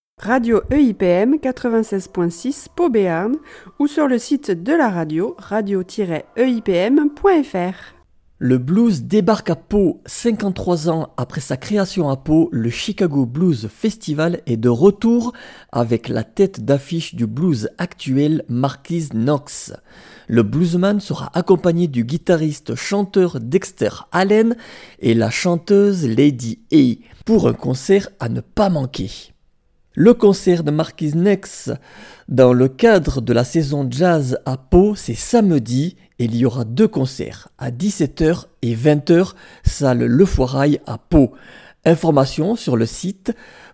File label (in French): concert blues jazz; Pau